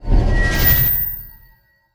door close, doors magic; dragonroll

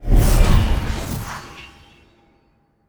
wall close, doors magic; dragonroll

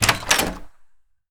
open, doors wood; dragonroll

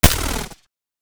forcefield, doors futuristic; dragonroll

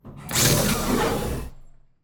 open hydraulic, doors futuristic; dragonroll

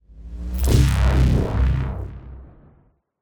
close forcefield, doors futuristic; dragonroll

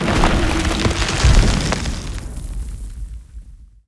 open rocky, doors stone; dragonroll

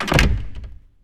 close heavy, doors wood; dragonroll

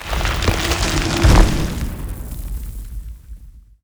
close rocky, doors stone; dragonroll

